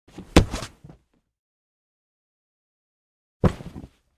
Body Roll; Hollywood Edge Martial Arts and Human Impacts

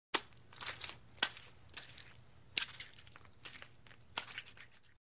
Shaving Cream Smoothed Onto Face; Hollywood Edge Foley Sound Library

